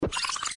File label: Rewind; friday the 13th killer puzzle